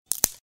twig snap sound effect

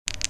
dice Roll Sound

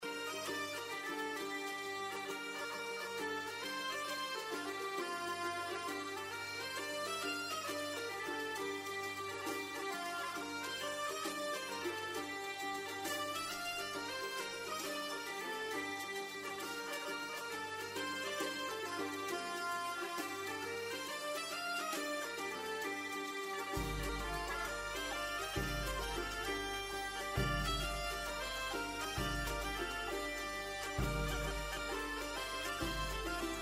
german Bagpipe Music of the Middle Ages; mindbuilders

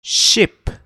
ship

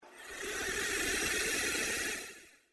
monitor lizard sfx slow

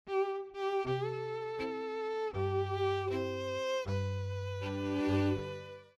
violin slow

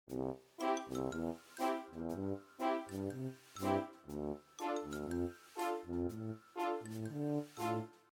happy music background slow

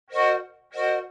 Whistle Steam slow; blastwave FX

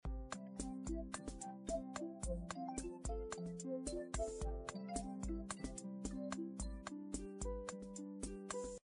bgm ringring

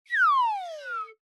slide down slow

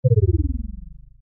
cartoon descend wobble low pitched; zapsplat

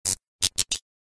light Bulb Flicker Sound Effect

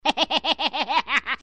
laugh hahaha

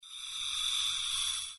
astronaut Breathing

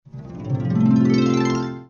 harp

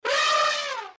elephant Sound Effects

